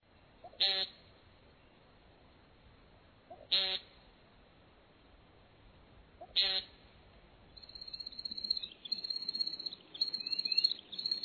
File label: American Woodcock; Bird Calls; Envirothon Jr. High Resources; Jr. High Enviro Wildlife Resource Packet